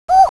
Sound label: crossbills toop